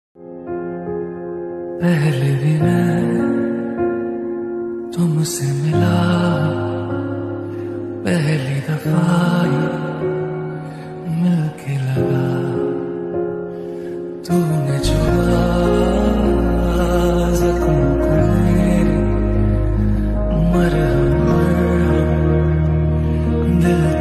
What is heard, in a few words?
hindi love song ringtone